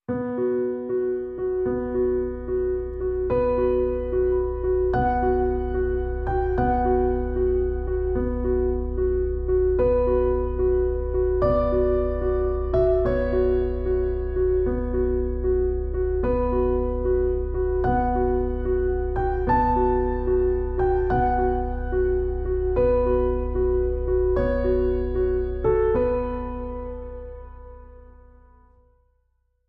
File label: Solo Piano & Sub